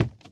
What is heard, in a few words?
plaster, footsteps, run; boomer